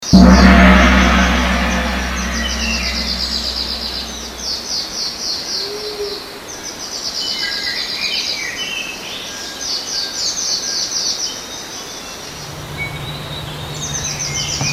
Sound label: Theta Nature